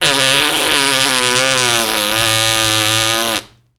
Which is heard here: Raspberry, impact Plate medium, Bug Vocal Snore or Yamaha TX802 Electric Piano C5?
Raspberry